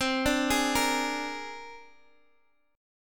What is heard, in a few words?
C 7th Suspended 2nd Sharp 5th, Guitar, Open G